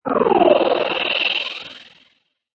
Magical Chopper Swirl, Magic sound of casting spell, conjuring or wizardry; Lynne Music